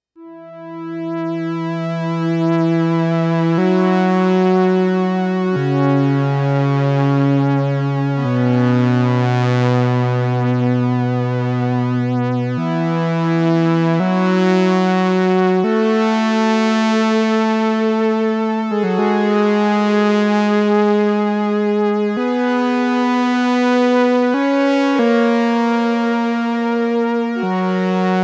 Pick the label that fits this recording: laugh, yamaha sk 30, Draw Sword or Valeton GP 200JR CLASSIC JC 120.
yamaha sk 30